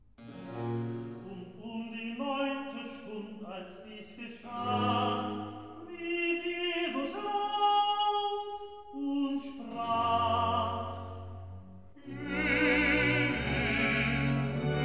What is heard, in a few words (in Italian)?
Recitativo, Accompagnato; Und um die neunte Stund'; Eli, Eli; Brockes Passion